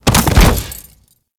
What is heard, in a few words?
Grineer Light Fall, Body Falls; Warframe